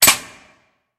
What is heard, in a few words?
Door Clang